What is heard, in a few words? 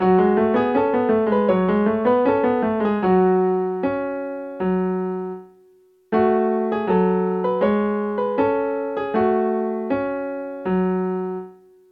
dotted 8th note in G flat m